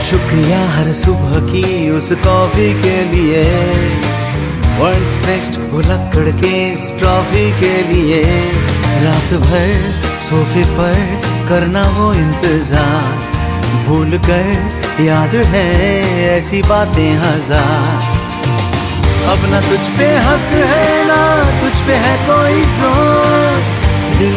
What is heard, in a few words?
Best Romantic Hindi Ringtone